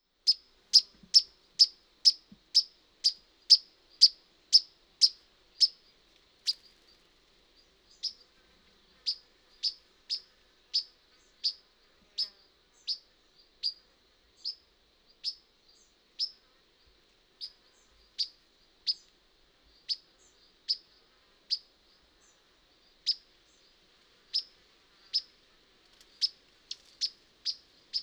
Margaspalvė musinuke - European Pied Flycatcher - Ficedula hypoleuca